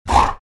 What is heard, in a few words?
grunt, greevil; npc; courier